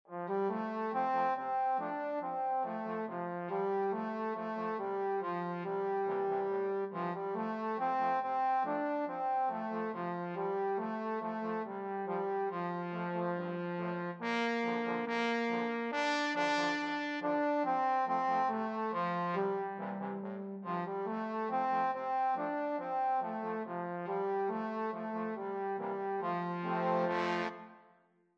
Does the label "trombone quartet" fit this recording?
Yes